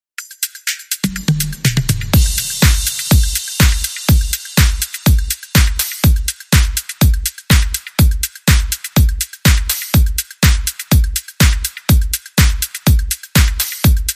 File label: Funky Shorty Beat